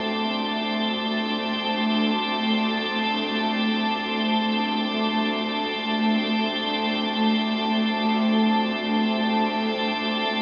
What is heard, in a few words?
Succession 92 Dm Synth Ambience, Ambient Piano Loops; C and Dm Night Mood